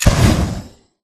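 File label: Tick Movement Traverse Jets; Titanfall 2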